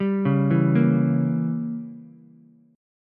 Bb Diminished 7th, Ukulele; 0,1,0,1; arpeggiated; chord shape

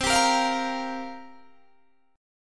C 7th Suspended 4th, Guitar, DADGAD

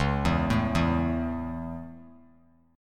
C sharp Minor Sharp 5th; C#m#5 arpeggiated; JGuitar

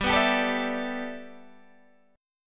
G 6th Add 9th G Guitar DADGAD, chord

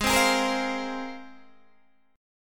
Ab 6th Add 9th Ab, Guitar, Open D

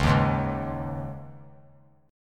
Db Suspended 2nd 3C,3F,3A,3D; strummed; Dbsus2; JGuitar

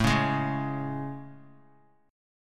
A Major 7th A; AM7; strummed; JGuitar

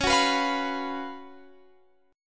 F sharp Major 7th Suspended 4th C sharp

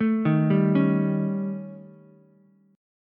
D sharp 7th Flat 5th, D sharp, down, Ukulele; arpeggiated; JGuitar

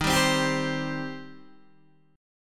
D sharp 6th, D sharp, down, Guitar, Open D

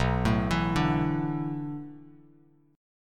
B-Minor 11th, Bass Standard; arpeggiated; JGuitar

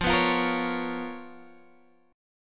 Db 13th F, guitar

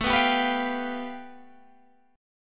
A 7th Suspended 2nd Sharp 5th

